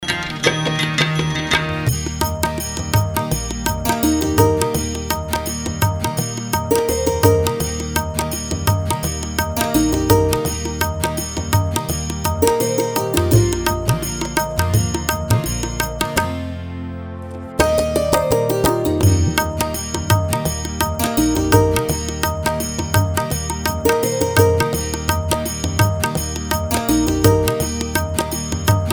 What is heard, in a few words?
Karaoke Song